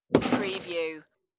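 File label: Snowball Brick House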